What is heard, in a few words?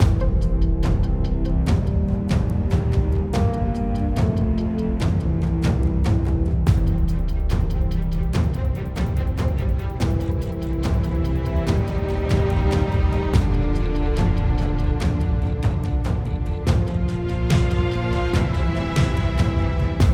Music Pre Race 72bpm; Forza Motorsport 6 Apex